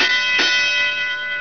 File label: tramway